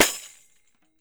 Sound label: PANDEIRO, Brazil Drum Perc